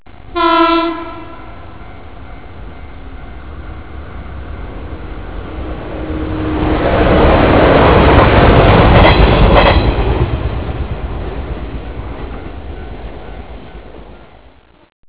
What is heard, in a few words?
train, 列車騒音 [Railway-noise]; 8bit・mono・au型式